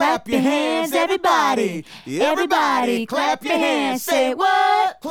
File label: Female Group, Hip-Hop and RnB Vocals